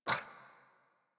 Puppy Idle Snort; Enemies; Vocals; Kubrow; Warframe

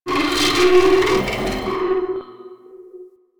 energy scream; Warframe; Corpus Railjack; Ambience

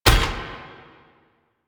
Necramech Melee Step; Enemies; Warframe

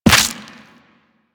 Run Bone, Footsteps; Warframe; Operator

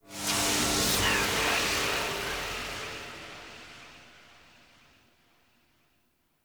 Puzzle Mural Hint; Puzzles; Circade

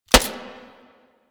Shogun Mech D, Shotgun; Weapons; Warframe; Tenno; Alchemist Shotgun

